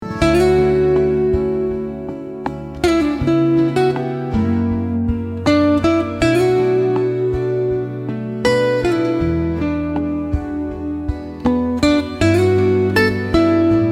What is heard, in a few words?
soft serenade, jazz soundscapes